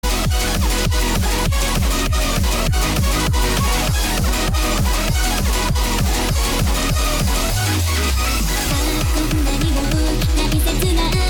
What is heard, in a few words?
HARDCORE style